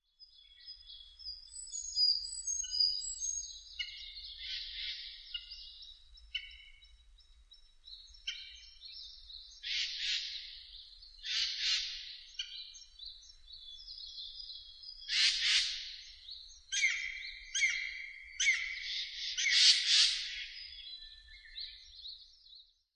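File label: aogera, Japanese green woodpecker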